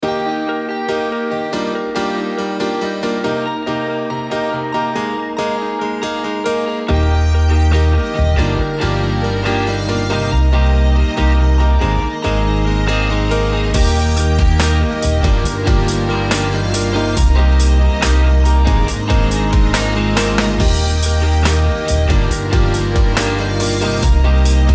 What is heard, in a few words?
Modern Pop Production; This Is Gold: Modern Pop Production